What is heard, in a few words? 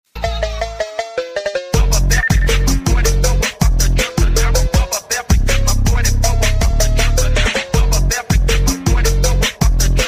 Fur Elise Phonk Remix; ringtone